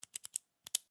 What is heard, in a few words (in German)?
Kugelschreiber